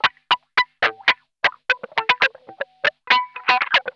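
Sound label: COARSE SCRAPE, Funk Guitar; Zero G - Funk Guitar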